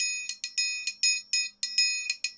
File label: triangle 100 bpm, latin; Sound Effect Library